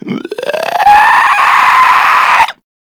Infested Runner Chasing; Warframe; Runners

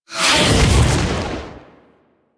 Purgatory Ghost Possess; Warframe; Abilities

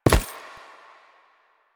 Step Default, Foley, Footsteps; Enemies; Soldiers; Warframe; Lasrian; Butcher

